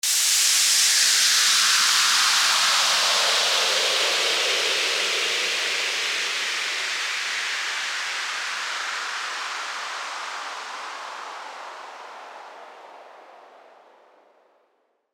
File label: WHOOSH